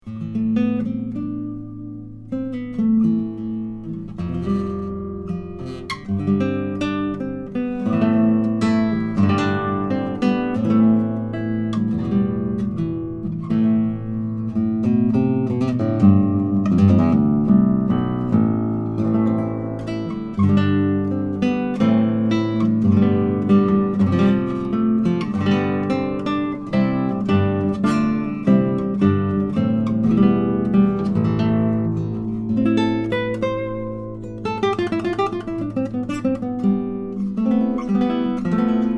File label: Improv, guitar